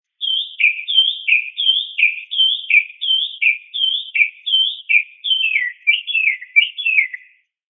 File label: Mocking Bird, A single mockingbird call.; Birds; Animals